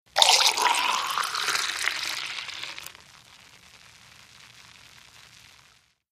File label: Beer Pour Classic, eating & drinking